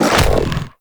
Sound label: Hoverboard Jump C; Vehicles; Warframe; Venus Hoverboard